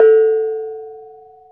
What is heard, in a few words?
BONANG, Bronze Gamelan